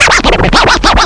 Got Funky; Apeiron 1.0.2

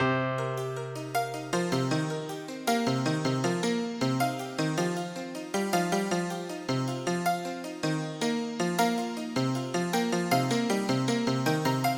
bass & percussion; musiclab-ifs; smus examples

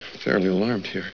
ALARMED

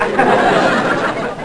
CANNED L; Spanish Net

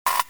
WARNING

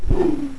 sun Disc Thrower Whoosh; NETSTORM

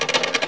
sun Cannon Raise Lower; NETSTORM

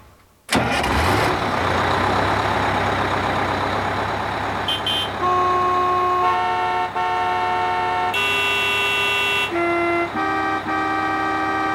Horns; The Corvette Anthology

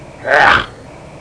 ughh; Sound Library 2000; Wayzata Technology; People

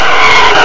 tyres; WRECKAGE

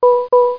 WARNING; smercs

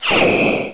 Earthturn; Stanley's Sticker Stories (1997)(Edmark)

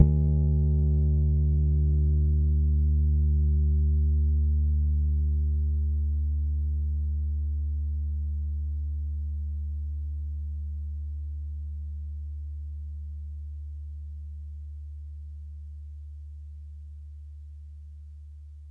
Fingered Bass D1; Pro Sounds Sample Collection

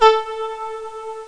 VIOLA

